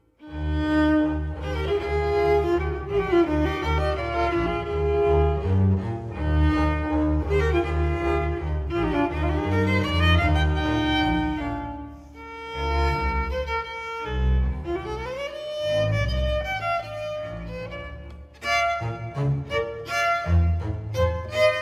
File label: trio; gassmann